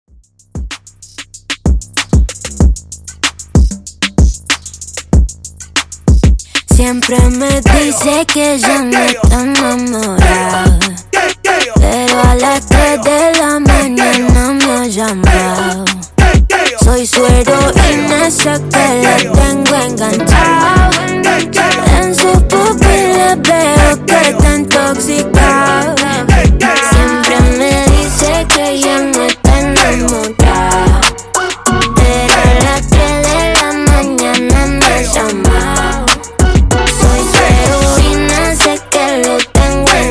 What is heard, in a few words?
Hype Redrum 95 Bpm